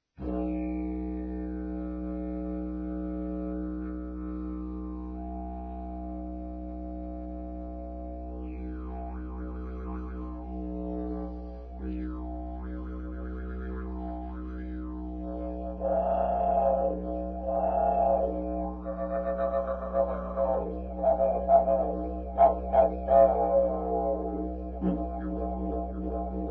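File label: pvc B, didge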